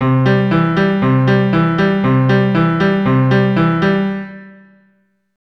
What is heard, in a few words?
C Arp pattern